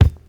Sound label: modern dry kick sound d sharp key; kick drum